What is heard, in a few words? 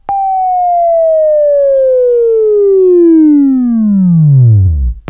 WAV Left, Sweeping; Labview-Program; Sweeping Training; Buzz